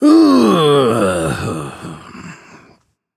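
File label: content, nonverbal; smite; voicepack; khepri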